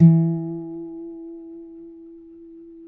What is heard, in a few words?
Finger E 3 L, Guitars; Spectrasonics; Hans Zimmer Guitars VOL-1